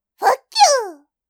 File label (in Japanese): ポップアップウィンドウのブロック